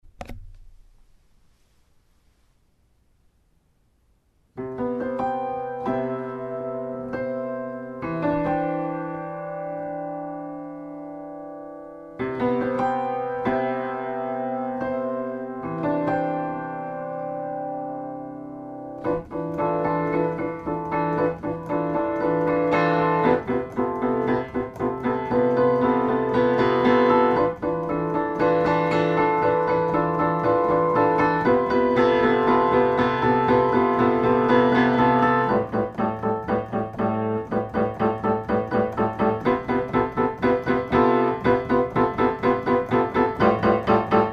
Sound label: Quiet to Staccato; Mishima